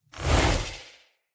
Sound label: Staff Caster Cast Heavy; Weapons; Player; Staves; Starter